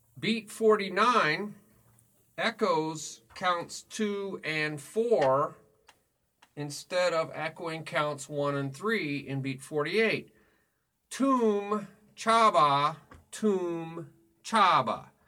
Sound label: Beat 1 2e 3 4e Toom ChaBa Toom ChaBa